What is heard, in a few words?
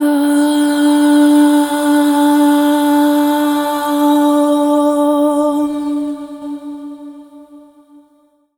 FEMALE, Dance Vocals